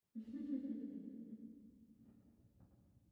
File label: ambience room witch lodge laugh